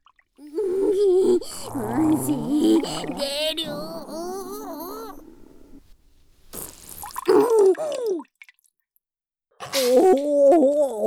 ローション灌腸排泄シーン 効果音入り; 実践!有機肥料の作り方 熟女指導員によるおほ声講習

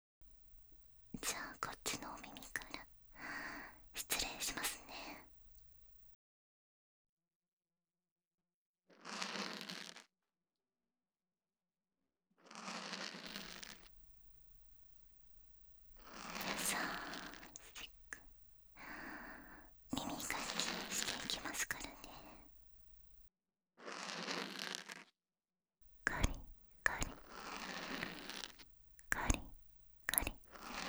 右耳 耳かき・梵天・ささやき・耳ふー, ASMR; 奥手なセラピストさんはあなたの疲れた心と体を癒したい ～おっとりお姉さんの特別サービス～

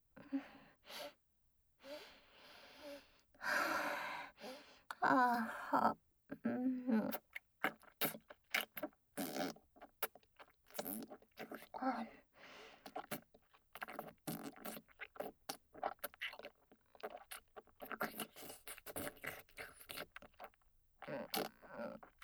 チン嗅ぎレロレロフェラループトラック, ASMR

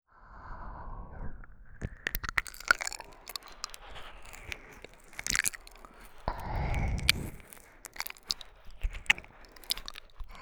ぐりんぐりん♪舌の位置が分かる耳舐め, ASMR

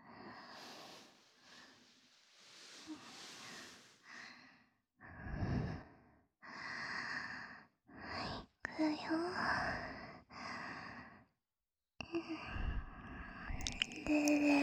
耳''奥''舐め＆耳奥''スタンプ''舐め♪, ASMR

